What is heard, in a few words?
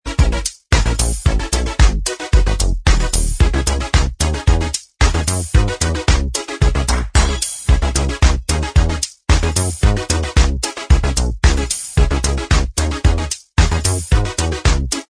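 backing vocals track, backing tracks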